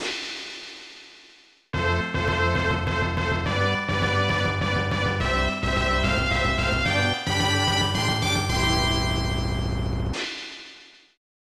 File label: Drum Roll; traverse starlight and prairie; snes